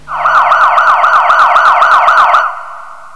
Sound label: POLICE; psion cd vol1